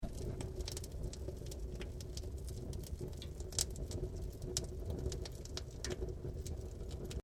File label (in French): eau qui coule